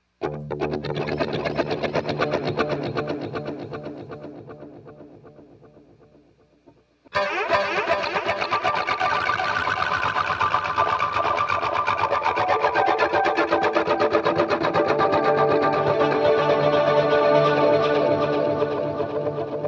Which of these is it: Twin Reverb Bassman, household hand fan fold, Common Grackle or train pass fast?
Twin Reverb Bassman